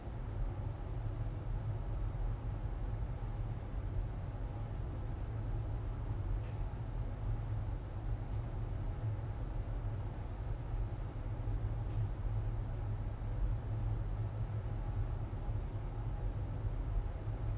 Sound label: indoor janitor room morning roomtone; LOOP; anemoi